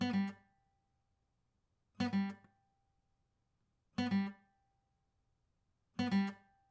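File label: acoustic pattern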